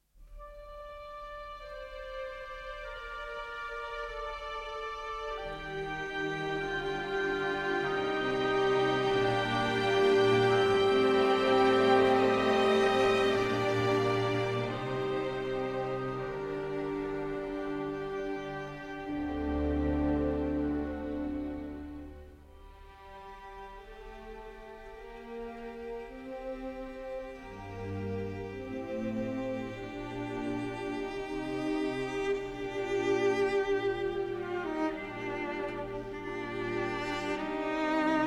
Cello Concerto in B-Flat Major, Adagio Non Troppo; Boccherini; Arr. Grützmacher; G. 482

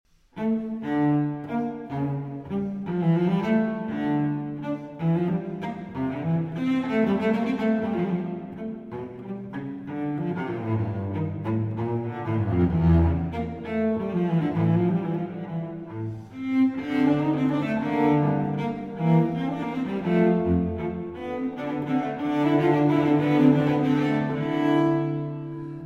Cello Suite No. 2 in D Minor; BWV 1008; J.S. Bach

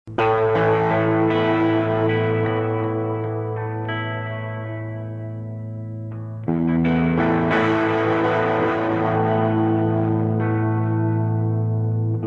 Guitar Solo

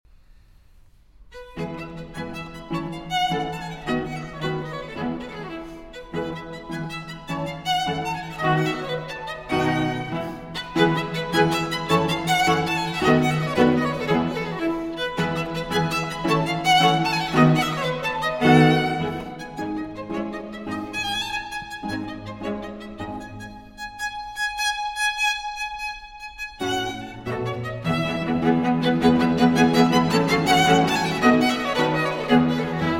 Quartet in E Major, Rondo, Vivace

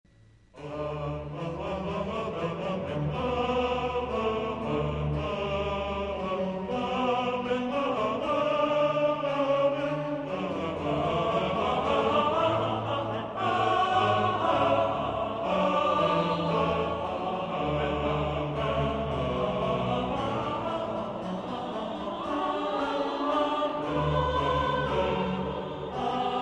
Excerpts Sung in German, Amen; Messiah, HWV 56

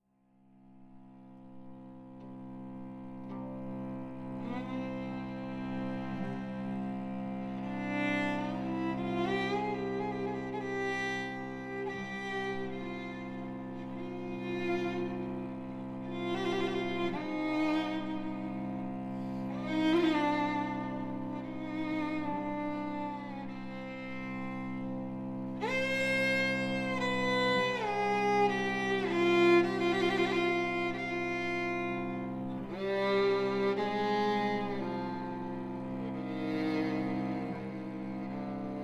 Zerbaghali & String Quartet; Afghan Suite No. 2; Version For Zerbaghali & String Quartet; I. Lavander

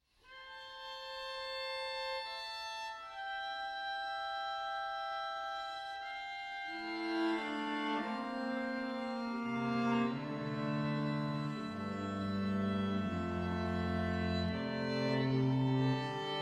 String Quartet

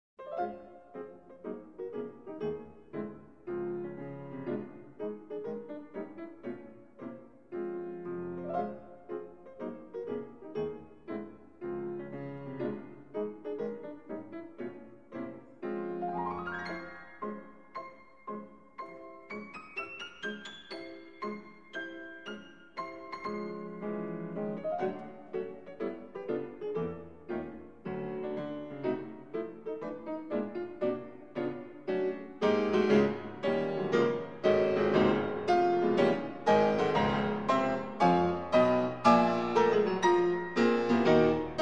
Pieces For Piano, March in F Minor

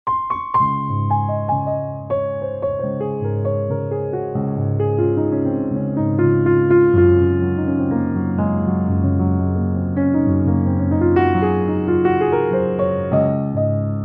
Jazz, Pub Piano

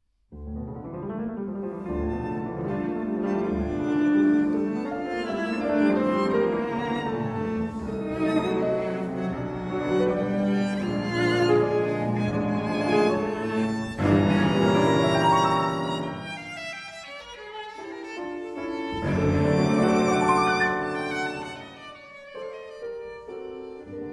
Trio in D Minor, Allegro Molto Vivace

